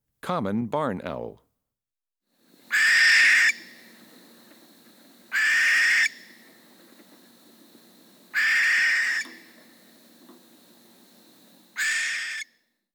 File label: barn owl; Peterson Field Guide to Bird Songs (Eastern/Central US)